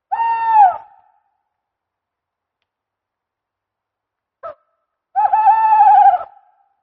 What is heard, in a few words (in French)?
Chouette hulotte